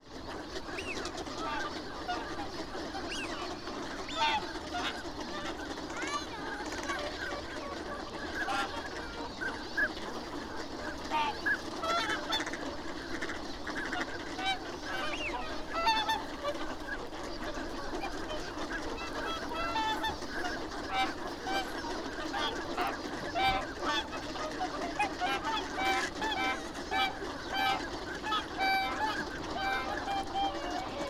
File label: abukuma ducks